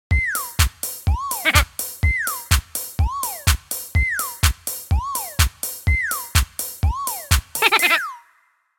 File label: Subscribe Disco; Kick the Buddy Forever